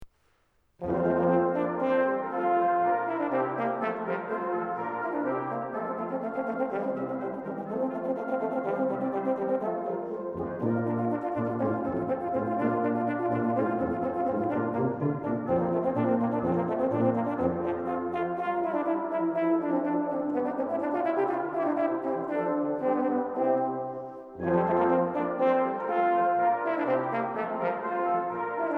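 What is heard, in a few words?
Sonata Nr. 1 in F Dur, II Allegro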